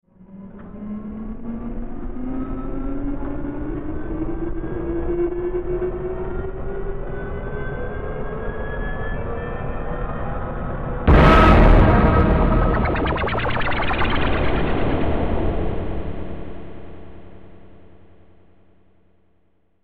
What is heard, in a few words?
space psychedelic